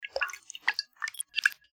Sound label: water drop